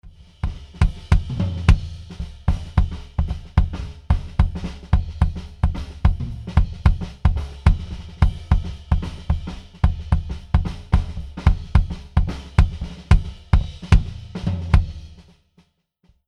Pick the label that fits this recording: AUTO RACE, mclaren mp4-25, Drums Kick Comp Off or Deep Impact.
Drums Kick Comp Off